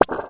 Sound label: Pop